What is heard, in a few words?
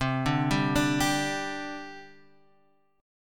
C Suspended 2nd C Guitar Standard; arpeggiated; 8,5,5,7,8,x; JGuitar